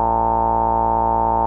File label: MOOG A2, Synthesizer; Hollywood Edge; Giorgio Moroder Rare Synthesizer Collection; MLTI.MOOG